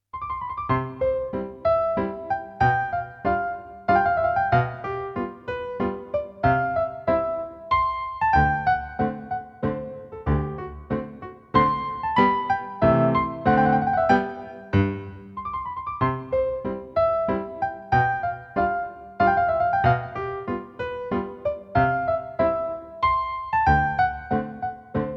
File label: MENUETT, piano; piano favourites